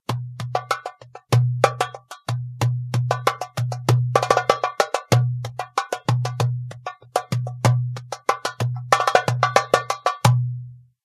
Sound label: derbuka